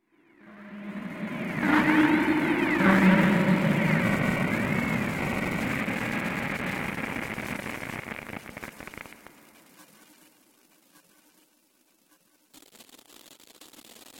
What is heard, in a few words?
noise